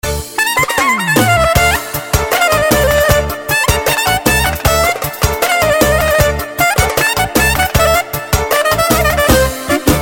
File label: Saxophone rhythm